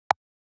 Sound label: Effect Tick, ui; Fujitsu Arrows-F-04H